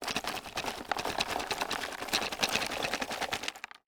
Wood Chips Plastic Tub Rattle; foley

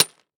Impact Rocks Hit By Small Metal Pipe; Impacts

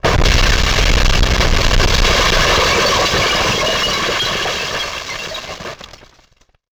Title Transition Communication Distortion Jammer; Production Element Title Transition

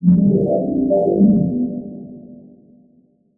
Title Transition Logo; Adobe; Production Element

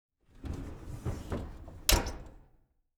Household Oven Door Close

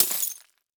Impact Ax Hit Glass Debris Fine; Impacts

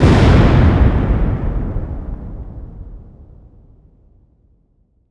Explosion Fireball Blast; Fire and Explosions

